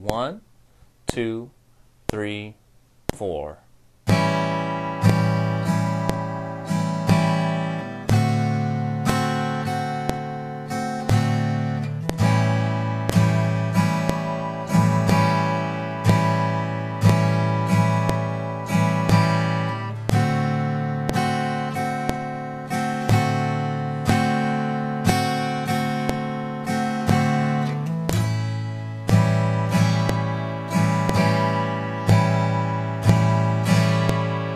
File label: strum blues in A, guitar